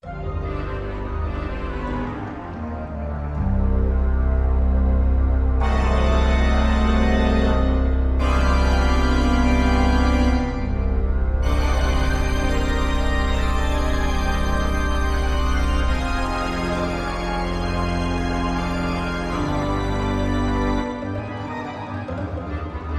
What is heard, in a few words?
Pipe Organ Suspenseful Horror Theme